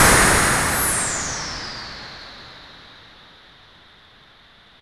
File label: Cymbal, Nord Modular Drum Samples; Yawgmoth